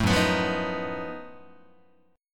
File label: F sharp Minor Major 13th F sharp down Guitar; strummed